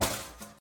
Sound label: Lantern drop; Phasmophobia